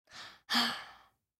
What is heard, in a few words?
Sigh Male Six Yrs Boy; Sighing; Human; Blastwave